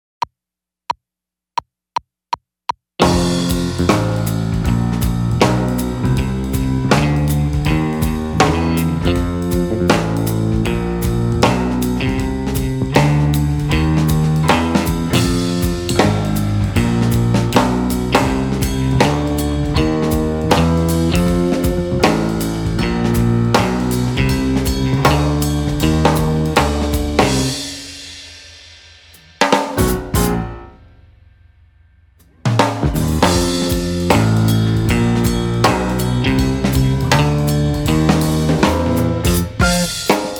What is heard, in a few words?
street music 125-148